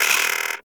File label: Vocal Pain; Hyena Micro